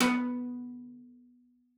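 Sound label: CELLO spiccato B3; 90 s Sample CDs; Xsample VOL-5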